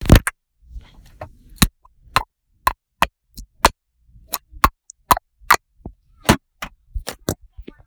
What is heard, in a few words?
candles target, Foley; TRUTH-HZ; Crowdsourced Sample Pack